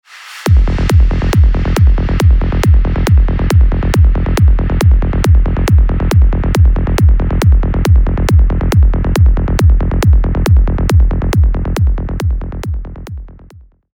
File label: bassline default